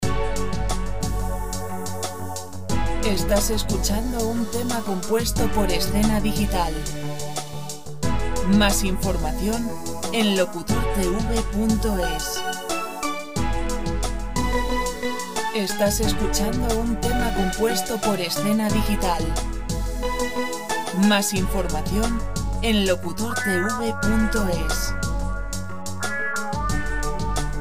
90 pop; Música pop sin copyright; Música pop sin copyright para internet

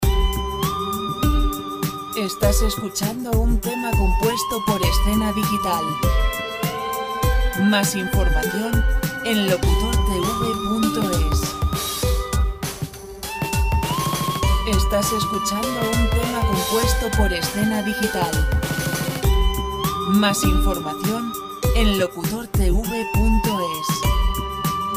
Gmaj oriental

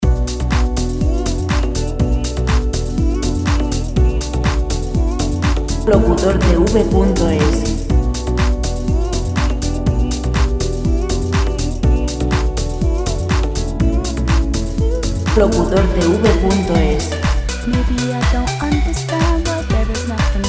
122 vocal, dance; dance musica libre

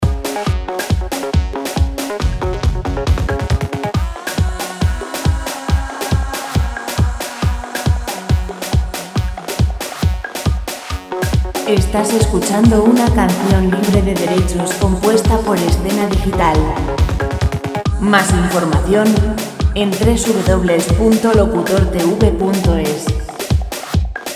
138 dance vocal; vocal musica libre

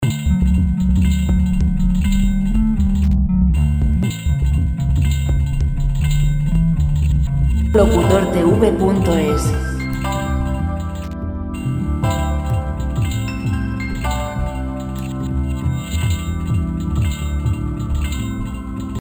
120 chill; clasica

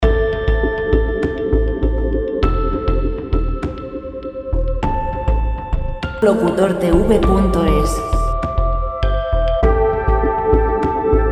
100 cinematic chill; chillout musica libre

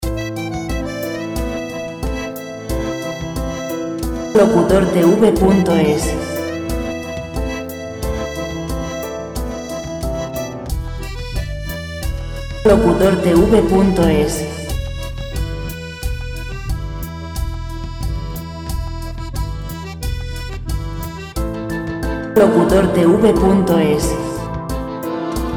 bucarest 90 folk; música sin copyright; musica libre

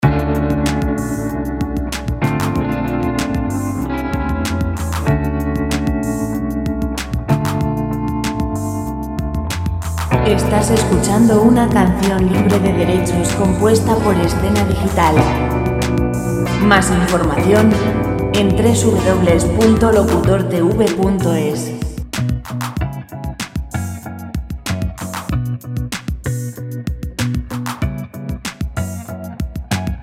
lent guitar royaltyfreemusic; guitar background music

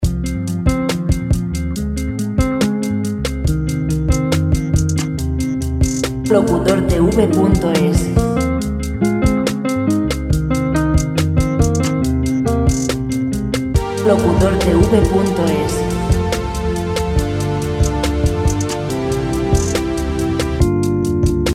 140 chill; chillout musica libre

